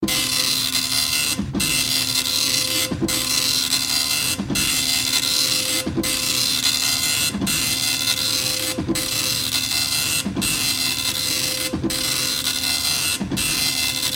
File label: old school printer